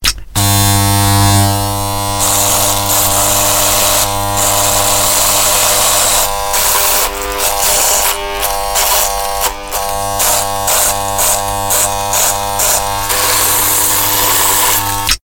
Shaver

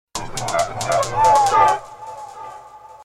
Atmosphere

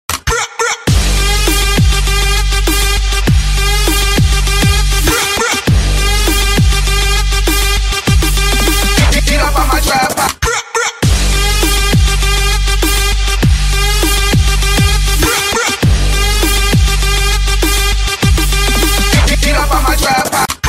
Dubstep Trap

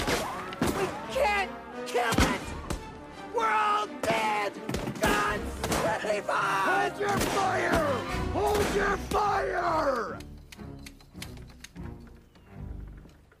Squirrel

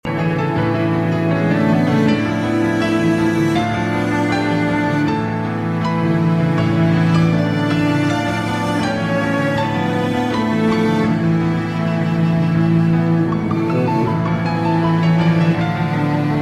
Piano

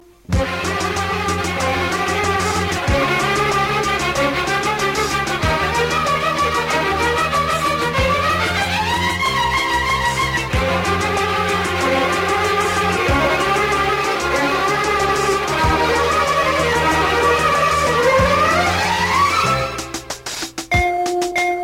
Thalavattam Violin

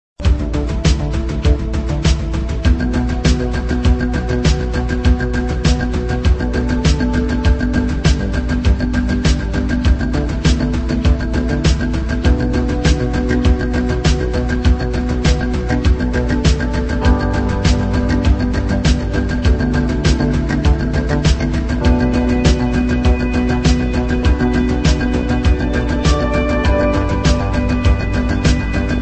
Disco Beat

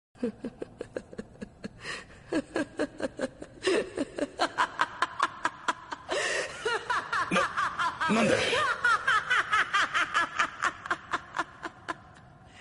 evil laughter

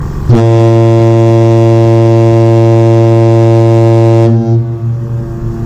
Boat Horn

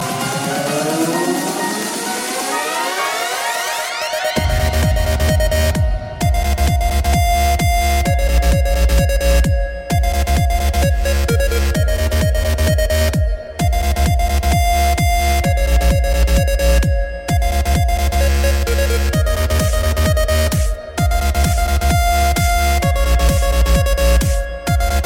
Electro Clubmix

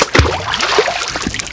Falls Into Water

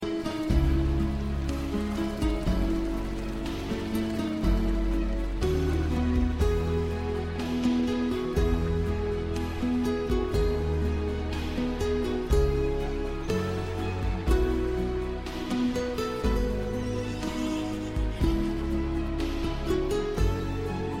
Love tone

